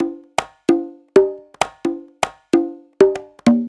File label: Live Percussion, 130 BPM